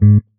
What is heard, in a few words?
marimba; ゆめ2っき